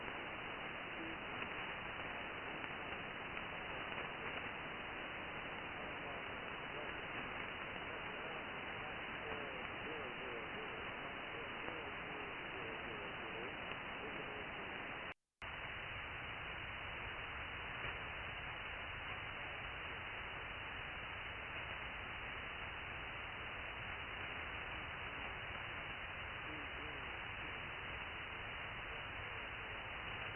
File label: 10051khz Volmet, USB; 10051khz-USB; Gander-Volmet; Kanada